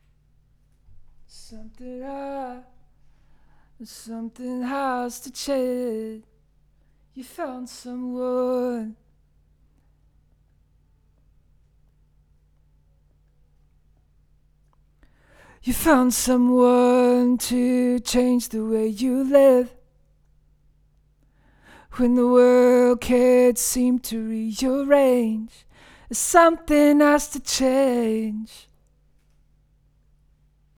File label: Vocal